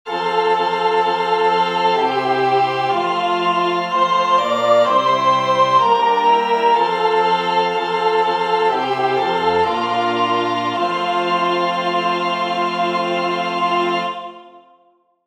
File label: Triple Alleluia soprano; singing divine service iii in parts